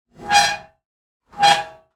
Squeaks and Moans, Just Metal; SoundBits

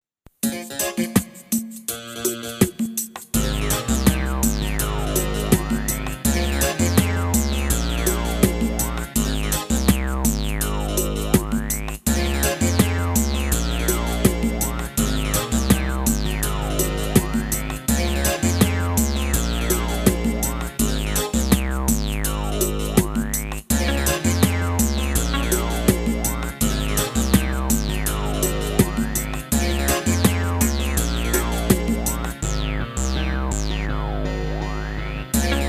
Ragga, Reggae

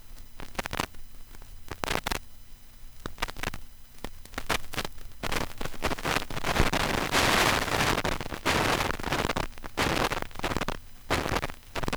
vinyl crackle; 99Sounds; Vinyl Noise SFX

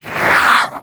Swamp Fish Creature Damage, Monster Roars; Phase 4 Libraries

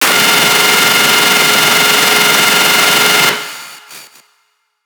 Glitch, Digital Glitch; Total Khéops

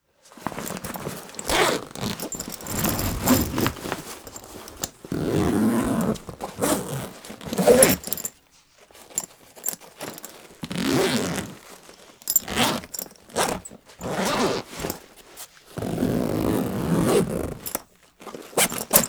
Zipper Backpack Variation; Zippers, Buckles & Clothing; Wav Junction Sound Effects

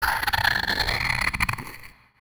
Tech, Weird Side Samples

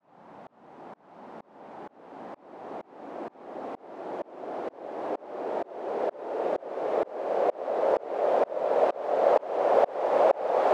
Long Sweep, White Noise

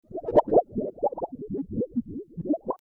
Under Water Sound; FXHome

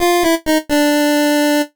jingles NES; Kenney's Sound Pack; Music Jingles

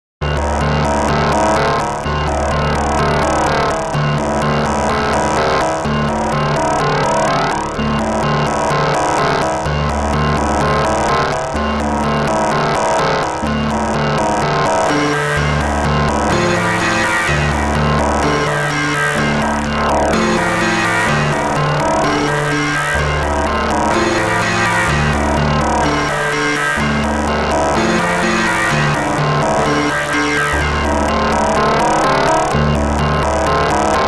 Overt Danger, 70s Sci Fi

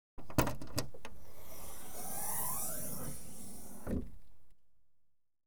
Car, Exterior, Hatch Opens; Auto; BBC Sound Effects Library

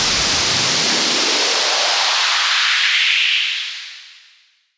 Very Short Sweep, White Noise

